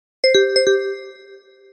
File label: Samsung S3 Official Ringtone